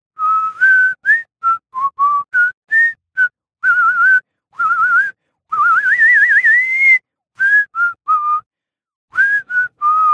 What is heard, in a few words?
Whistle Sample; bilingual, versatile male voiceover art; professional, bilingual, versatile male voiceover